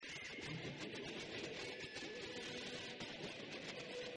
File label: loud gain